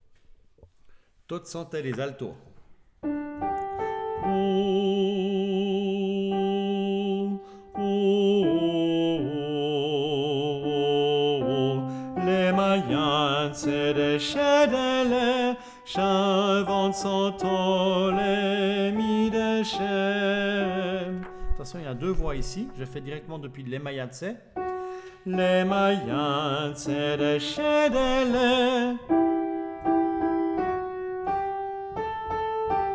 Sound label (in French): Chants To Tsante A